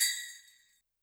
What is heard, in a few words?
Jingle Bell; YAMAHA RM1X